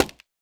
脚手架放置声

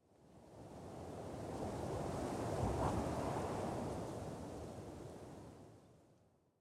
灵魂沙峡谷风声; ambient

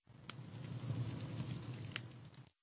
营火燃烧噼啪声

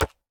树脂砖击打声

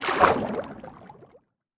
进入水下声; ambient

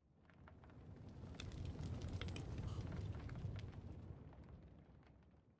烟熏炉燃烧声

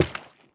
珊瑚挖掘声

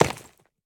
滴水石锥落地声

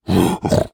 猪灵愤怒声

